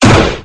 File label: gun; hellboy